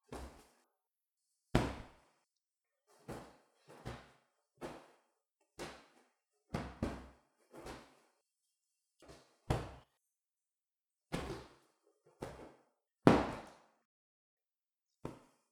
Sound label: Pillow Fight